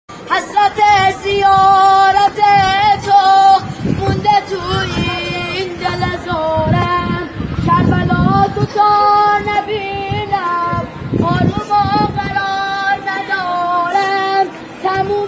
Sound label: nohe sedaye nojavan